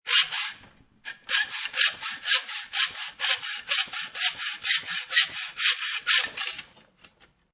Sawing Metal, sawing metal with a manual band saw, the sawed piece falling off; Metal saw, band saw, sawing metal with a manual band saw, the sawed piece falling off at the end.